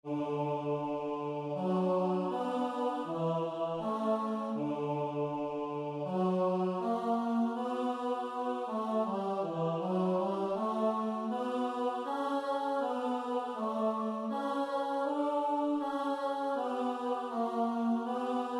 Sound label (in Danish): Bariton; Dejlig er jorden SAB